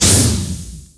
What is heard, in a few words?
bloodbat attack